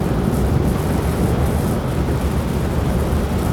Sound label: Miner Power Shoot Loop